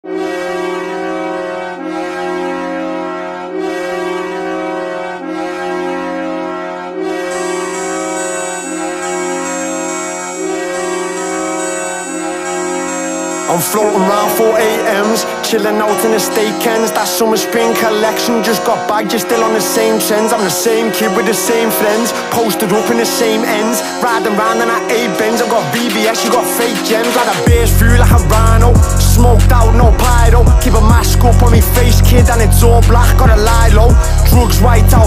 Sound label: music